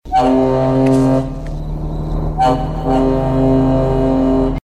large boat horn, Large boat blaring a foghorn to alert visitors.